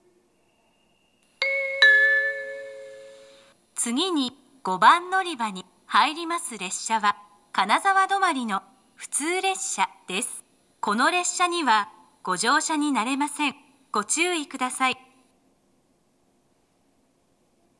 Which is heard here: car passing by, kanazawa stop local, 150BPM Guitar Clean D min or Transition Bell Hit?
kanazawa stop local